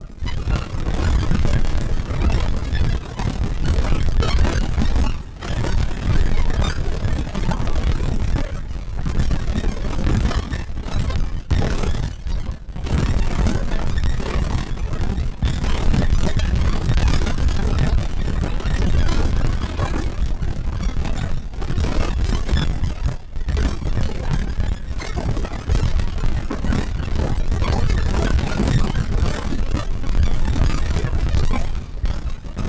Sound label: kick explorations